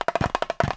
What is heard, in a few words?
LOOP Perc Mono